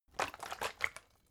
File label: bottle water big shake single soft; berufe